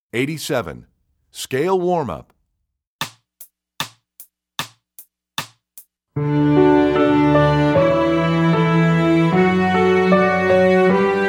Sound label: Scale Warm Up, Strings; EE2000 Book1